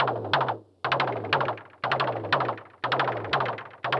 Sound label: eurorack